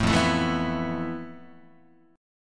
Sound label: F Major Sharp 11th, Guitar; strummed; JGuitar